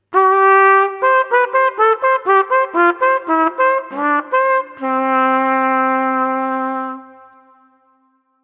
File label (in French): impro trompette; loto sonore